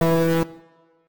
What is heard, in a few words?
wrong button press; Maze-Lover